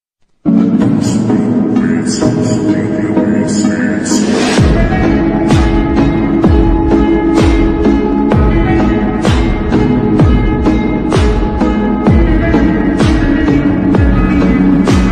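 viral instrumental bgm ringtone remix ringtone bass boosted bgm ringtone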